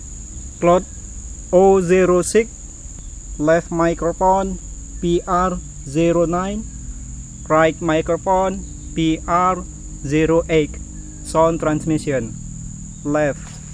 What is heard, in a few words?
birds